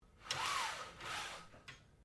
Blinds Venetian Adjust, Household blinds; Living Room

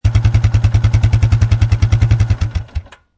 Quad Bike Shut Off Exhaust Perspective; Motorbike, motorcycle type Quadbike; Shut off engine; Motorcycle Sound Effects; Lynne Music

